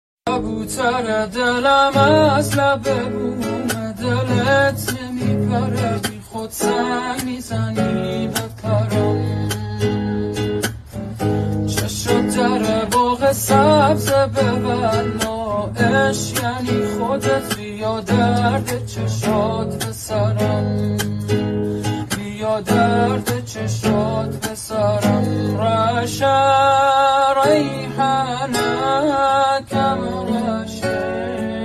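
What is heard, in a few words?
guitar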